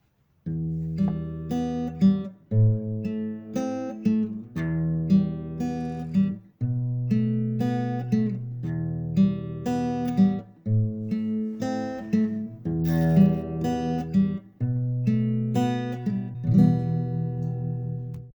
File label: guitar; STALZONE; customnpcs; stalker